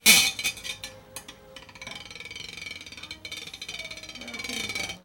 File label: carboy clean